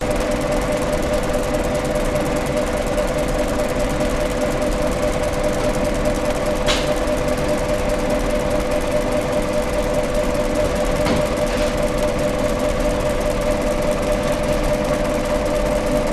German Bakery Stirring Machine Running Bakers Working In Background Short Beep; your free sounds